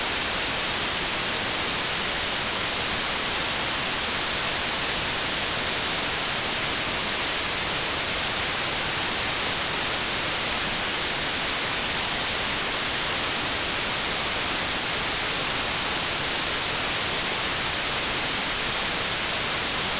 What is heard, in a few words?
white noise